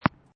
Tennis Ball Bouncing on Court Close; Lynne Music

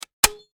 Industry Staple Gun Into Wood Single; Machines; Staple Guns

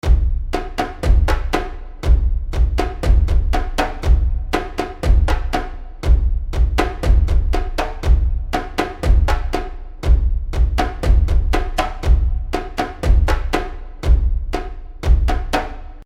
Primitive Drums Seamless Loop; Battle; Primitive Drums - Seamless Loop 02; Battle Sound Effects

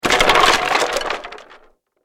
Impact Debris Wood Crash; Materials; SFX Bible 6

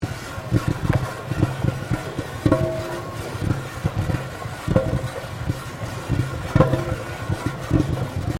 Berdan Pan Operating Loop, Colonial era objects; Machinery; Machines

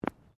FOOTSTEPS FOOTBALL BOOTS CONCRETE STEP; Footstep Foley; Sound Effects:Human

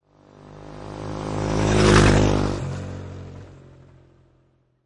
Motorcycle Dirt Bike Drive By Right To Left; Motorcycle motor. Motorbike type offroad Dirt Bike; Lynne Music

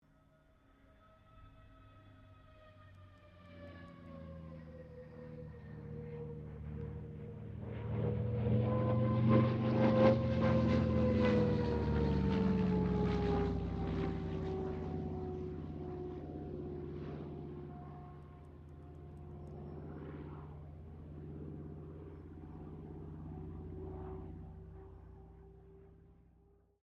AIRCRAFT CA-13 BOOMERANG PASS; Airplanes; Vehicles; Aircraft Collection

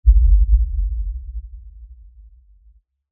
LFE Impact; Low Impacts; BlastWave

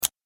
Multimedia Button; Andrew Fleming

